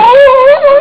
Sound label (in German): winsel; Amiga Soundtracker sample